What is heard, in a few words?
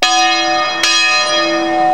bell